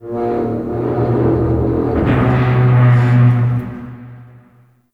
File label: Metal Creak; Ambience; Warframe; TheNewWar; Zariman